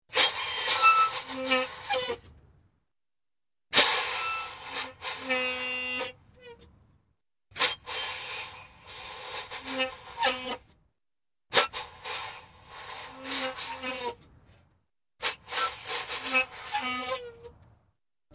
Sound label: Air Brake Hiss Honk